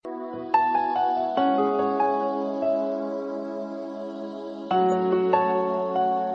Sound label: Message Ringtone